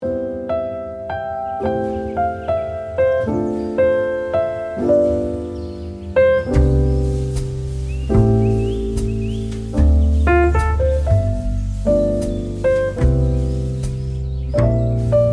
relaxing jazz instrumental music cozy coffee shop ambience and smooth piano jazz music; for studying